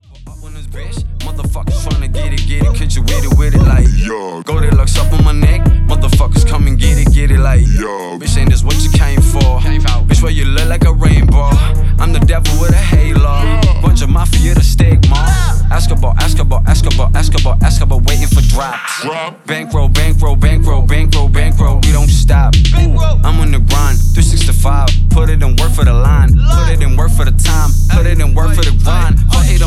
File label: Hip Hop Rap